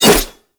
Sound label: Heavy Whoosh, Dual Daggers; Prime Okina